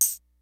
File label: G-FUNK KIT, DRUMS; Kid Nepro; Elite